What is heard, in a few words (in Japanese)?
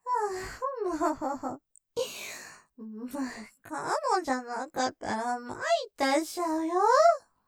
余韻; まいったしちゃうよ??; ステレオ